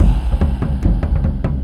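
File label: Music Shield; From Dust; PS3; Xbox 360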